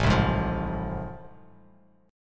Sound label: A Minor Major 7th Sharp 5th A, Bass Standard; strummed; AmM7#5; JGuitar